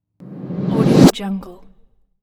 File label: whoosh sound